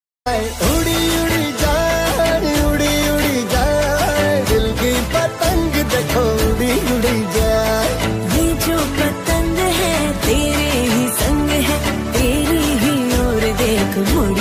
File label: sanskrit ringtone